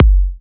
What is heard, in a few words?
Bassdrum